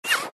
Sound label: shell whizz